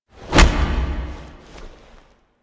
Foley Throat Grab Tense; Cinematics; Stalker; Warframe; Orbiter Cradle

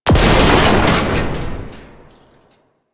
rail mech explosion; ambience; Warframe; Entrati

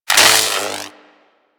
Lightning Rifle Spark C; Crp Chain Lightning Rifle

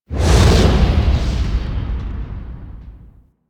Death Orb Pound Shockwave, Traps; Warframe; Orokin

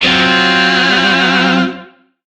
Shawzin Hirajoshi Chord G; Music; DE; Warframe; Lizzie Shawzin

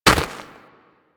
Walk Snow, Footsteps; Warframe; Operator

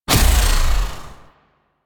Hoverboard Race Gate Hit; Warframe; DE; Vehicles; Venus; Hoverboard Racing

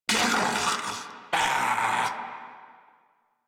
Laugh Ghoul Sarge, Barks Battle

